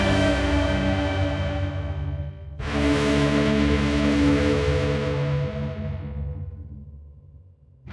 epic sting; sound effects

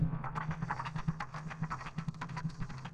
android notification

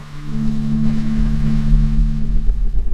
air horn; sound effects

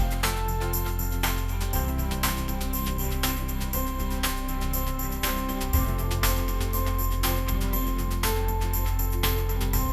corporate sting; sound effects

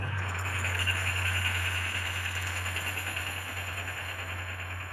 footsteps

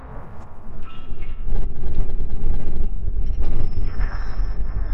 crickets; awkward sounds

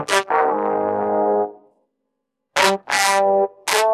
sad trombone; sound effects

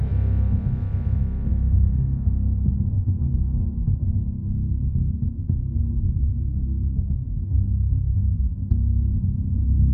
suspense music; sound effects

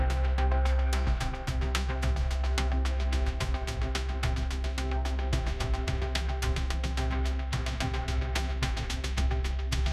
tension music

